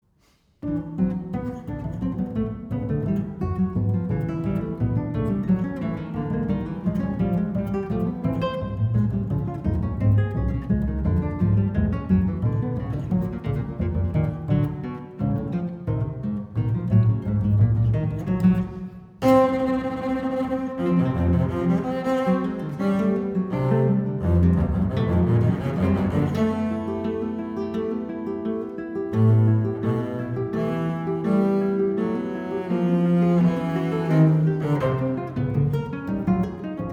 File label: Suite for String Bass and Guitar